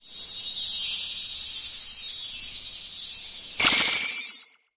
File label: tame success